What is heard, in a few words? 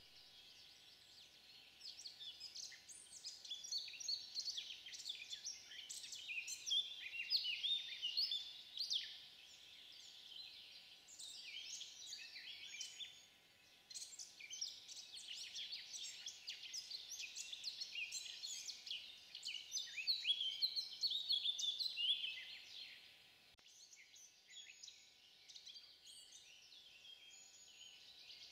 bird